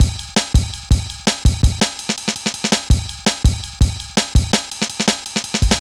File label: Jazz Ride, Drum Loops, 165.5; Jungle; Zero-G Jungle Warfare 1, 2 & 3; Jazz Ride B; 90 s Sample CDs